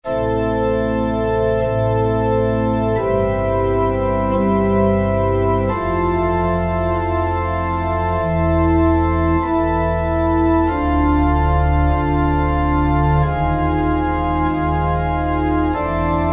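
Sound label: Organ Pipes, Yamaha TG500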